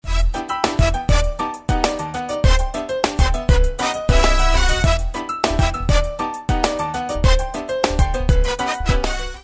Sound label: Spanish Salsa